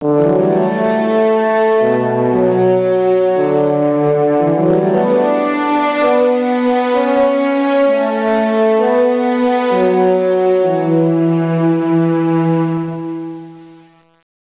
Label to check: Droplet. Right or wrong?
Wrong